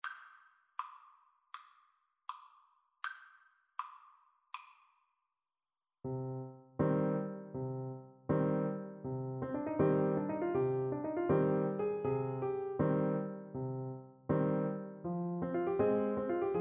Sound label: forza, French Horn